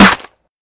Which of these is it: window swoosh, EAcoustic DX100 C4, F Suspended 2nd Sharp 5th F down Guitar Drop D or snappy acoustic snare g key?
snappy acoustic snare g key